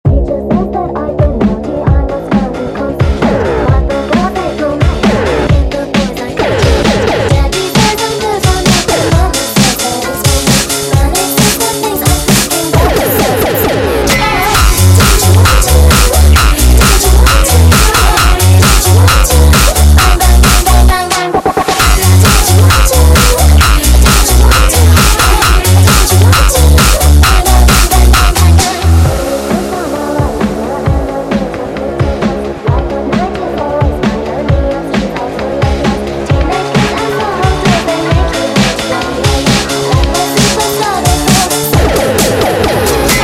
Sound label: tekk sped Up